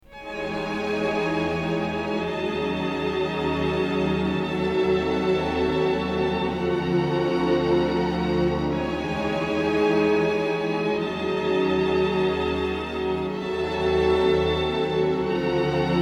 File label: music for strings; bagin